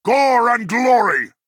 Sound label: battlerage orc attack